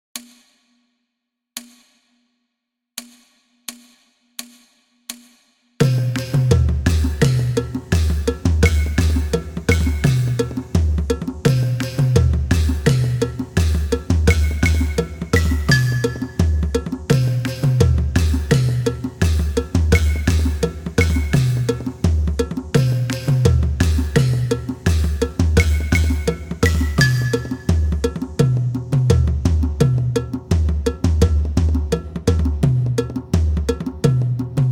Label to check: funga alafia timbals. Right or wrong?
Right